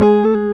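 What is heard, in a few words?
Guitar Jazz Riff; Proteus 2000; Multisamples; Digital Sound Factory Proteus 2000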